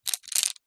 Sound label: crayons many handling movement; office